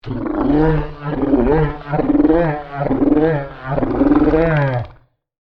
Monster Yeti Vocal, Horror, Monster, Yeti, Vocalization, Several, Creature; Monsters; Blastwave